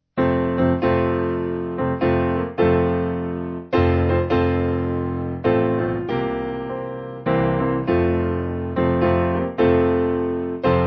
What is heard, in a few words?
piano